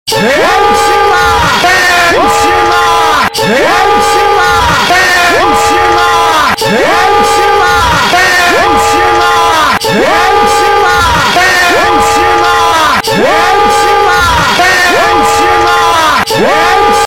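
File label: aaaaaaa, woman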